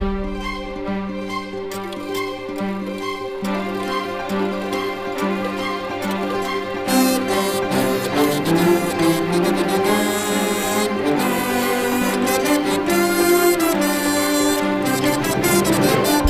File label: music world music shooter intro